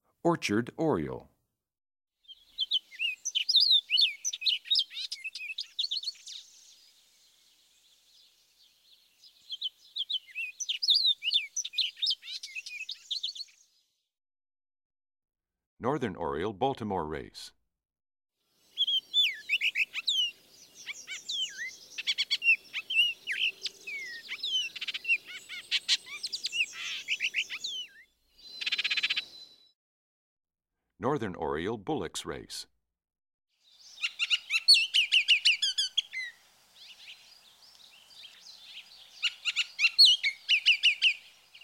Orchard Oriole, Baltimore Oriole, Bullock's Oriole, Summer Tanager, Scarlet Tanager; Eastern-Central